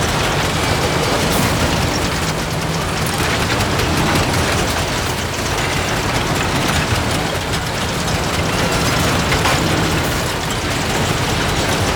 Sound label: Elevator Loop; Gameplay; Warframe; Jade Shadows; Ascension